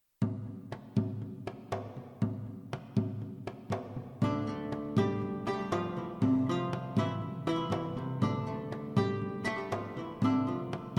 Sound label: wizard shop; PhoenixCraft-1.16.5; ambience music